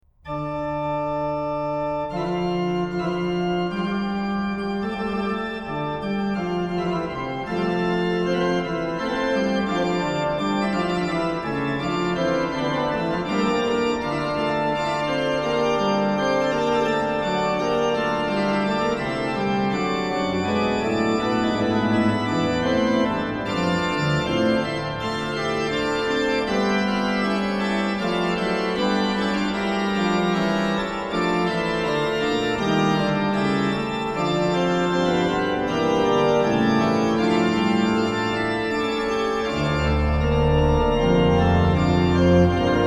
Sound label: Fughetta, organ; BWV0696; Fughetta super- Christum wir sollen loben schon Was furchstst du Feind, Herodes, sehr; BACH; BACH ORGAN WORKS; CHORALE PRELUDES